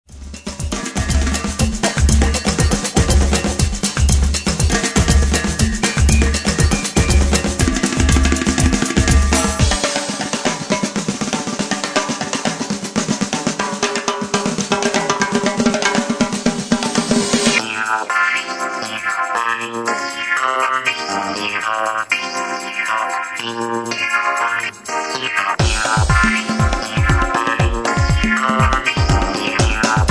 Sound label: Samba